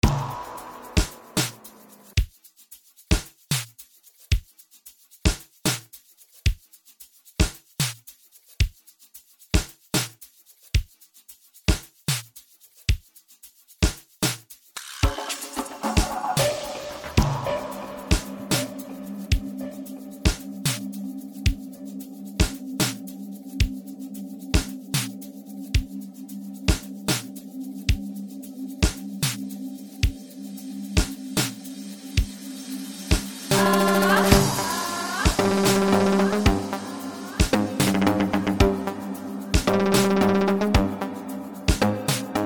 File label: Bells